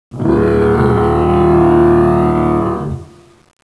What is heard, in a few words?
swing, tentaculo; CSO KrakenBoss